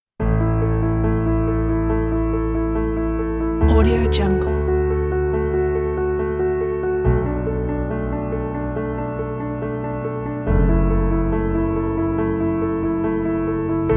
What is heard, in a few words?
inspire piano